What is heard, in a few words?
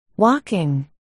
walking